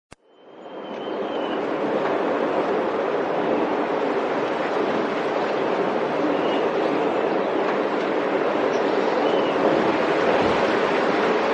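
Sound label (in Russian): ветреный звук зимой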